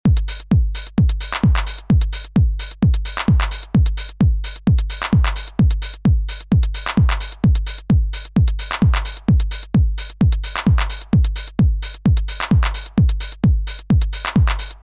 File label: 909 Bus Dry